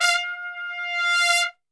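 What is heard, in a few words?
F 3 trumpet, Brass Super Section; Best Service; TRP SWL; 90 s Sample CDs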